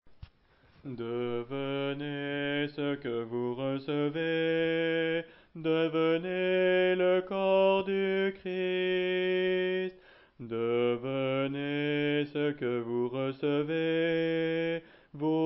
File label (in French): Voix de basse